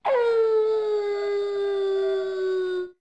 6pm Wolf

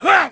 Swipe; Ganondorf; Zelda

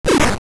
Gust Jar Blast

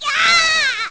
Fall Lava; Link; zelda

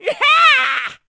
Fishman Hit; Fishman hit with an arrow

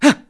Side Jump; Link jumping sideways

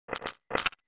Kick; Skull Kid kicking Link

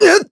Hit; Ghirahim; zelda sounds

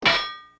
Arrow Bounce Metal; An arrow bouncing off metal (2 pitches) (Recorded)

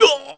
Parry Hit; Ganondorf; Zelda

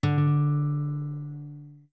Notes Guitar D long; Zora guitar (long)

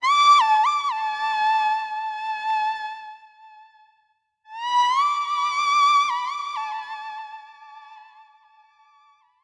Hawk Grass; Whistle with the Hawk Grass

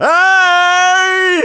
Goat Loose; Fado: "Heeey!" (shouting about a goat running loose)

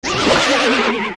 Die; Leever; zelda; OOT

